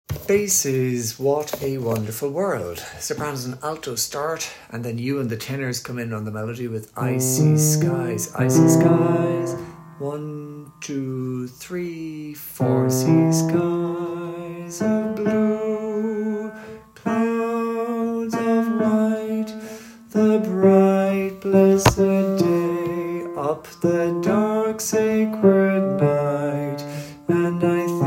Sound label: Bass